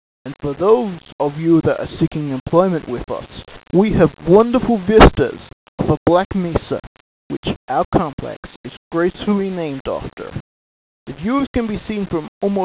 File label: tv